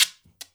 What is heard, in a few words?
GUIRO; InVision Interactive; Percussion Slam